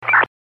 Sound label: Long MP7 ID; Tait MP7; MP7 is the digital identification protocol used on MPT1327 trunking systems.